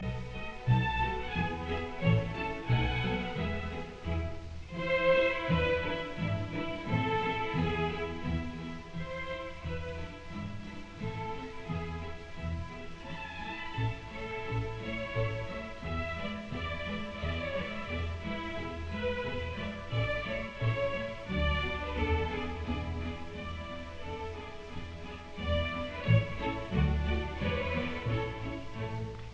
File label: String Quintet in E major, Minuet; Boccherini; Op 11 No 5; G 275; arr L Stokowski; Leopold Stokowski